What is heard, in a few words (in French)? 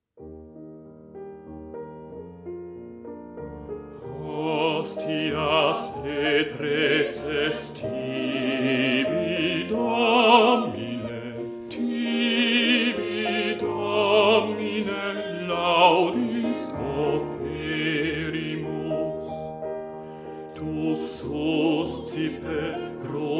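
basse; hostias; schola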